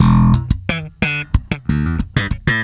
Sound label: SWEET & LOW BASS